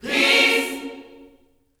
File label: PEACE chord, Gospel; Spectrasonics; Vocal Planet Vol.1 Gospel; akai